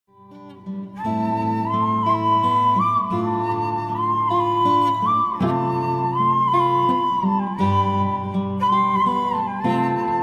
flute version ringtone

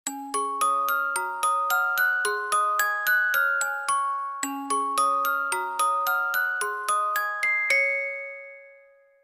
beautiful box music, ringtone

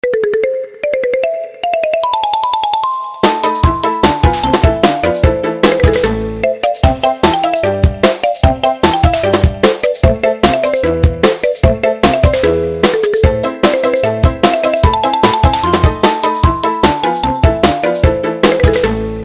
marimba, ringtone

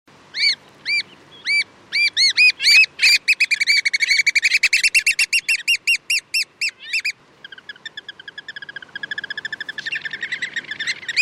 american oystercatcher whistle bird call ringtone; iphone

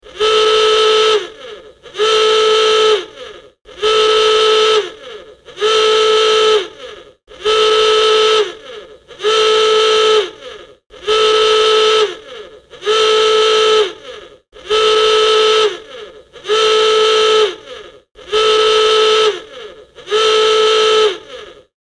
klaxon

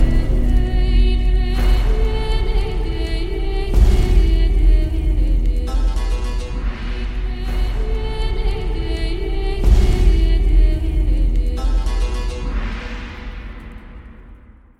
BGM Ringtone; Ponniyin Selvan

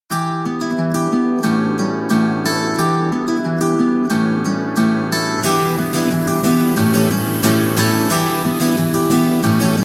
guitar ringtones

